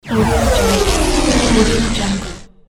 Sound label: electric